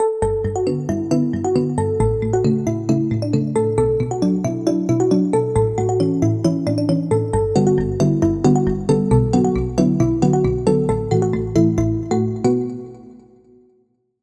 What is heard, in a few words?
Bell; Crystal Savior; Routed Sound; Vital Expansion - Story Walker Vol.1